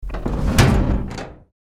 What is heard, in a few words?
Metal drawer full close; household